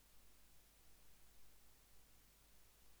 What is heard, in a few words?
Output GND MUTE Chage